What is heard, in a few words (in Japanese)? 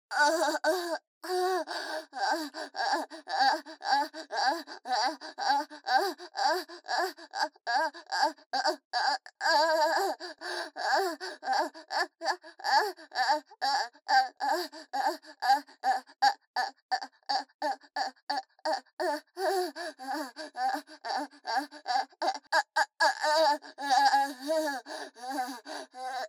喘ぎ声